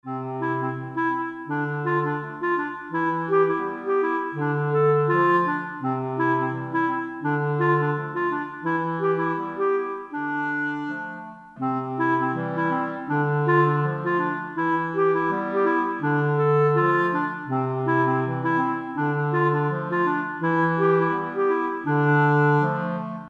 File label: Clarinet Theme; Saxophon, Klarinette & Blechbläser